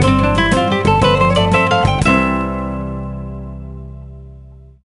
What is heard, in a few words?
Jingle Best; tamagotchi connection corner shop 2 ds; Hime